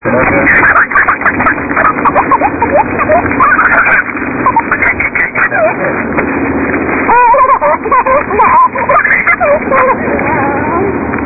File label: giggleing